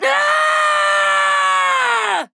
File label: Death Fall; Flak